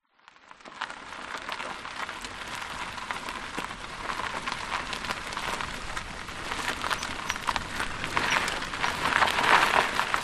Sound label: vehicles car wheels roll engine off dirt track grit short stop